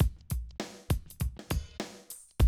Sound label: drum loop, 100 BPM